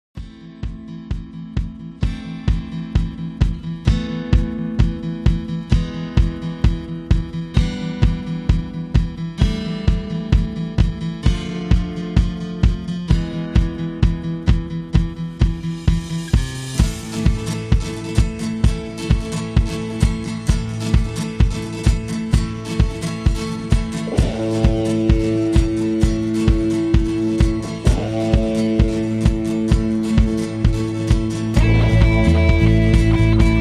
Hoedown Patter Low Key

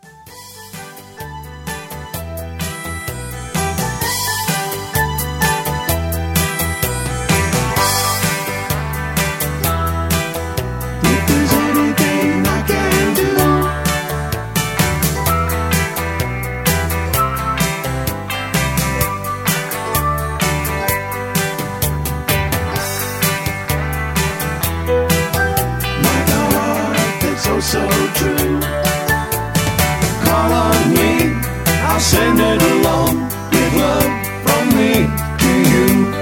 Instrumental with Harmony

